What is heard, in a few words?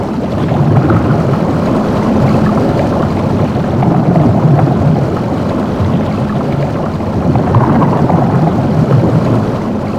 Galleon Water Tube; Warframe; Grineer Galleon